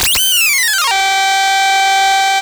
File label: HITODOWN, Anime Sound FX; COMIC